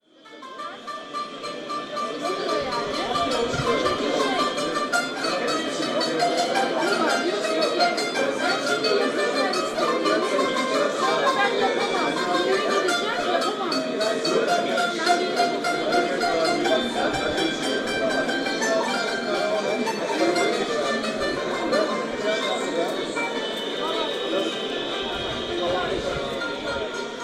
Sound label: kanun taksim; Kadiköy